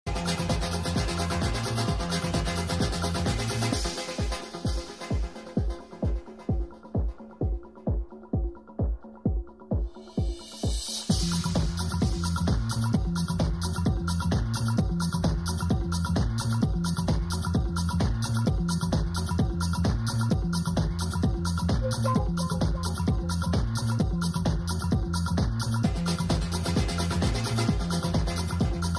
dance sounds

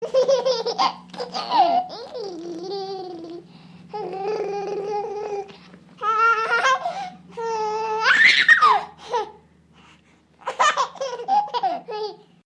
happy baby

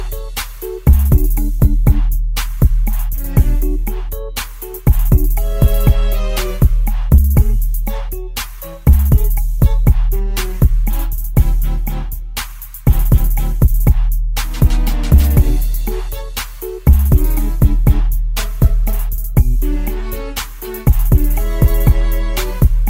Trap Remix

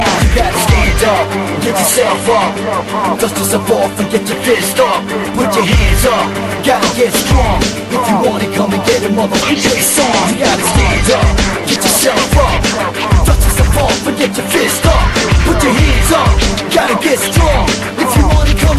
ringtone

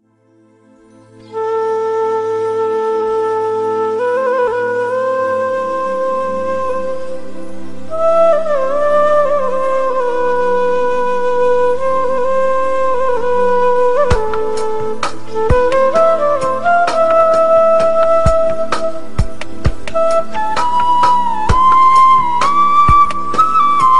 Flute Fusion

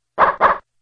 poochie bark

